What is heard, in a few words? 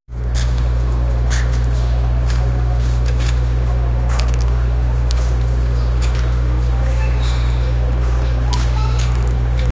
organic realistic calming, shop